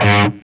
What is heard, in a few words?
SOLDANO ROCK, Guitars